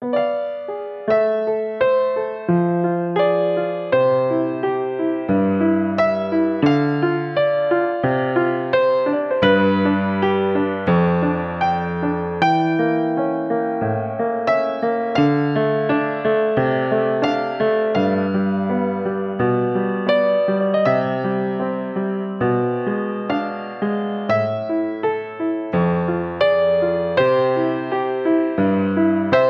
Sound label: Last Light (Piano version); Metro Last Light soundtrack